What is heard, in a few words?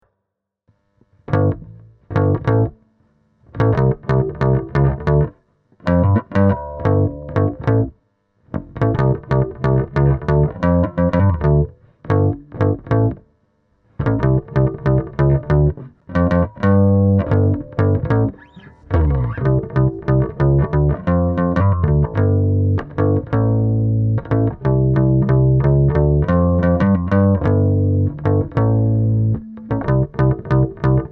Bass, bass guitar